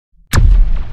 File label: darkness aura ball